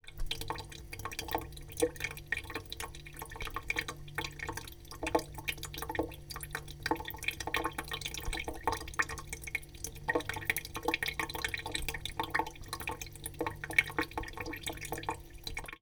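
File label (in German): Küche; zoom h4n pro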